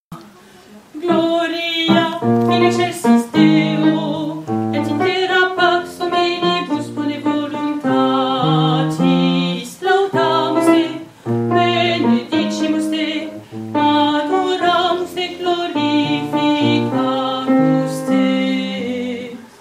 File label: alto; gloria